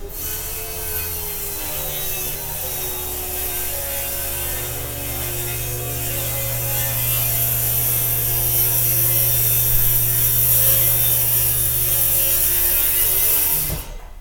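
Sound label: electric rotary cutter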